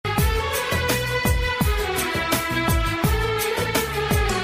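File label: Romantic Instrumental Old Ringtone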